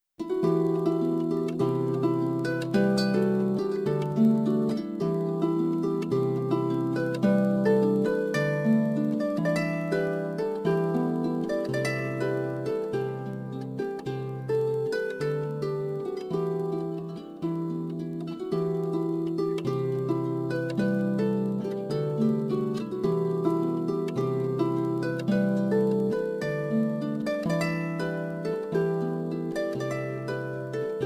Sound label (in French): Harpe celtique